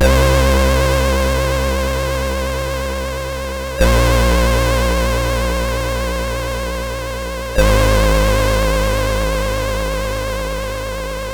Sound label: oceanloader hits